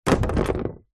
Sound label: wood hardboard panel thin set down concrete ground; foley; zapsplat